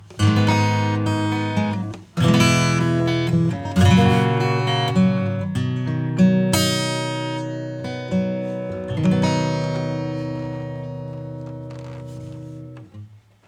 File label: acoustic EQ